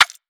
Percussion, Hip Hop, Drum One Shots

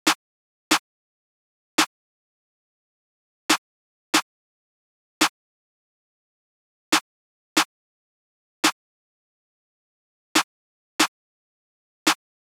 Drum Loop 140 BPM Snare; Drum Loops; Loop Stems; Cymatics; Mystery Pack Vol 4; Pass Go Drum Loop

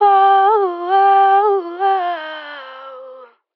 Ad Lib Dry - C Min, vocals; Cymatics - Eternity Sample Pack; Ad Libs

